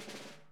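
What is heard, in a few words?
snare buzz; Sonic Reality; Interactive Drum Kits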